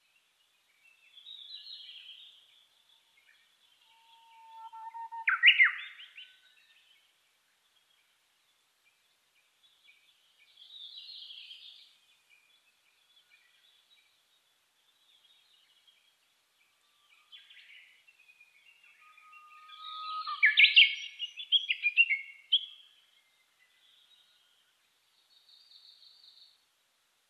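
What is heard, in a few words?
Japanese bush warbler; DH-D50-uguisu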